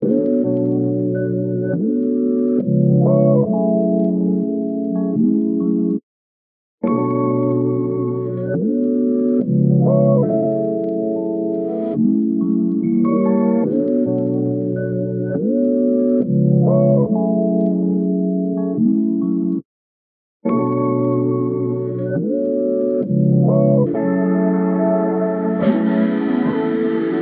neo soul loop dry; wavefield

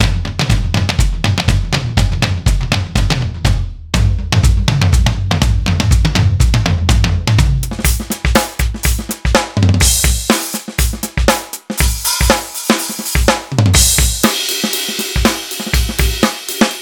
the whole spectrum, tonal drums; soniccouture

